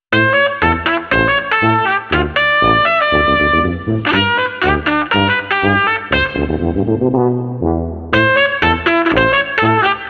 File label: Street Trumpet Combo Oompa no Drums 120